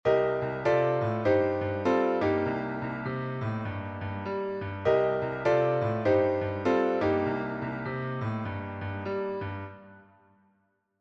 triades mineures, Blues Piano; Blues Licks

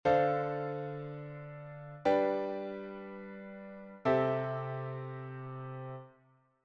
accords, enchainement ii-v-i; Disposition 1 8 - 5; Une position intéressante que l'on exploitera dans la suite ( drop 2 chords ); Enchainements ii V I

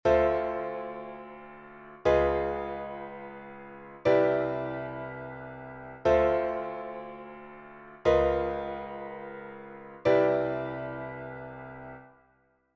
substitution tritonique, substitutions reharmonisations

